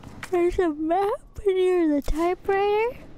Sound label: Yawn